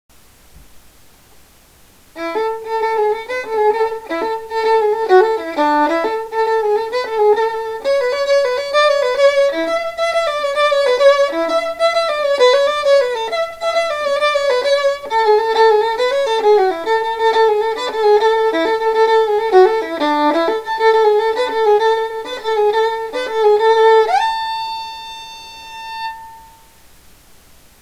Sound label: Violinist; from the world-famous theatrical show "Lord of The Dance"; as led by Irish/Celtic Dancer Michael Flatley